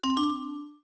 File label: Braille Chord Memorize; quinton's new sound scheme